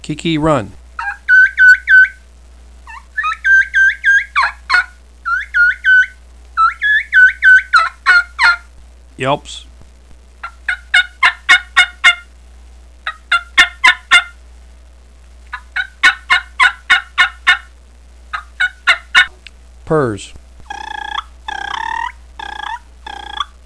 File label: blue kee kee run yelp spurrs, turkey hunting